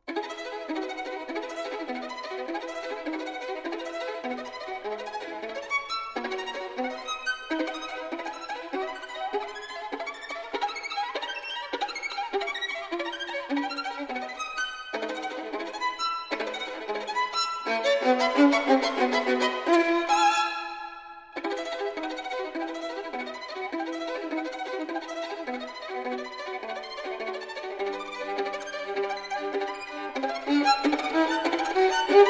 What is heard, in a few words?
Caprice for violin solo in E major (Andante); Paganini